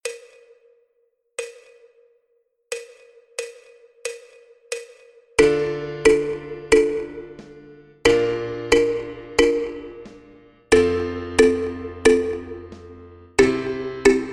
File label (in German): Refrain langsam; Platteninstrumente